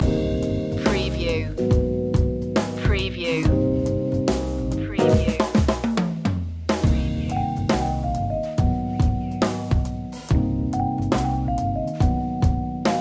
blue funk loop; slowfunk

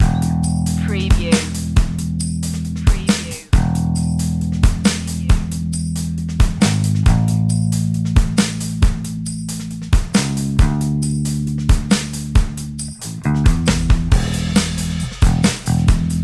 breezy inspiring drums and bass

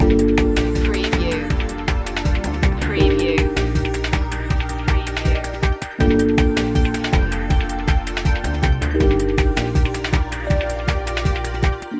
loop, Ambient Rhythmic Textures

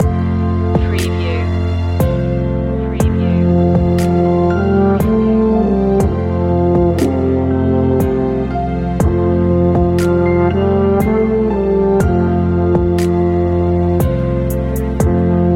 away in a manger euphonium feature; christmas; traditional

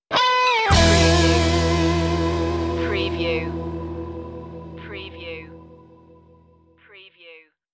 delta sound stinger; blues

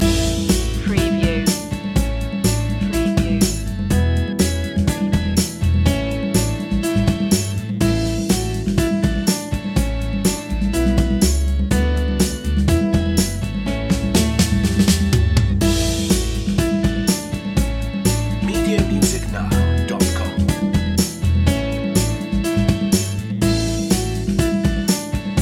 london bridge instr loop, nursery rhyme; childrens

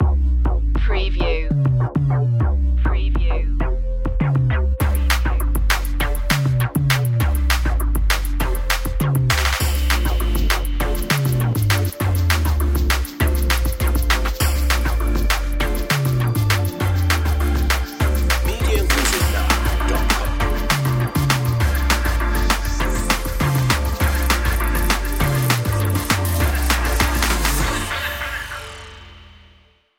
Drums and bass; race

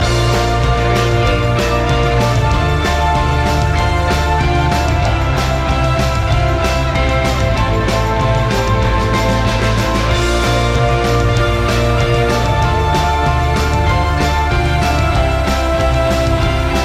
loop; pop-rock; vocal

